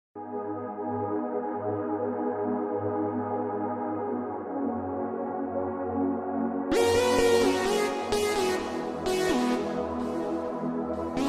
som automotivo especial reggae e bregadeira

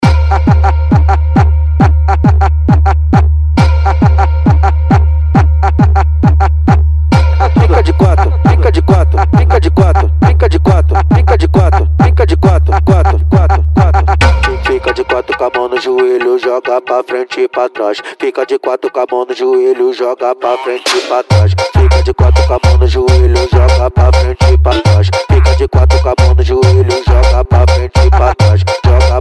especial funk mega bass

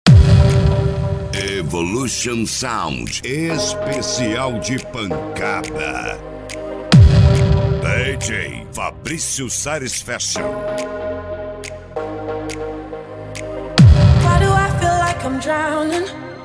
esp de pancada

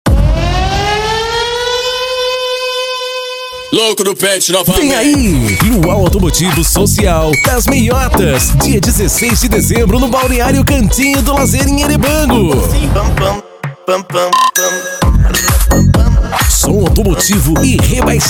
luau automotivo

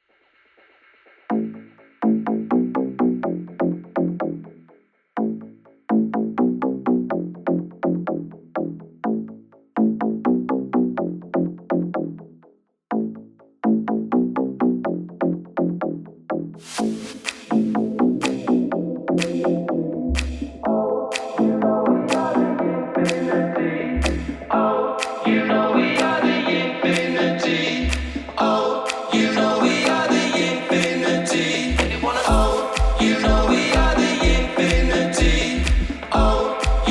eletronica; cd bass extreme

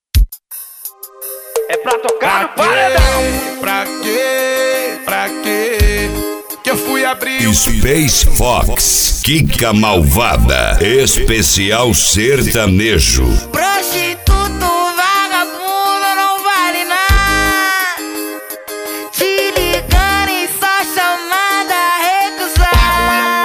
sertanejo

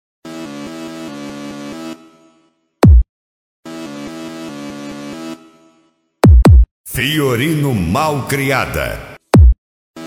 esp mega funk bass

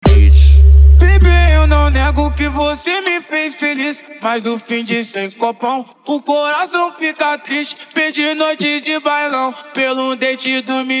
mega funk e funk mega bass

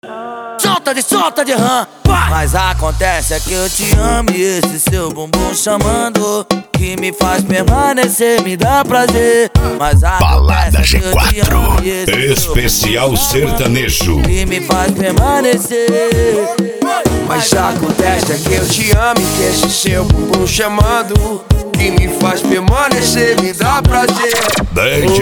esp sertanejo; balada g4

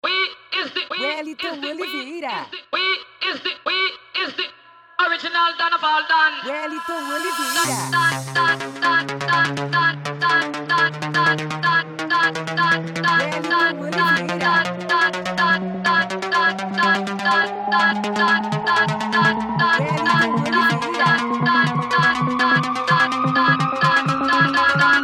funk tumdum e mega funk

